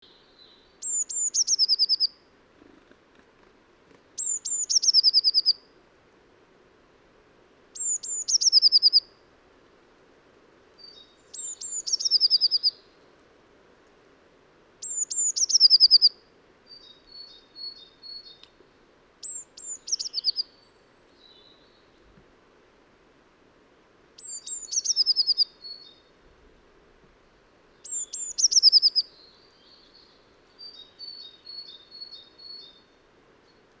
chant mesange bleue; oiseaux

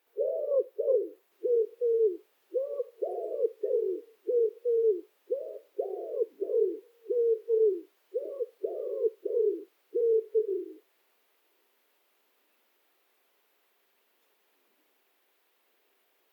chant pigeon ramier; oiseaux

